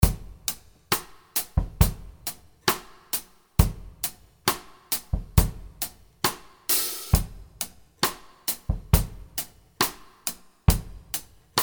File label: drum loop 135 bpm verb, half-time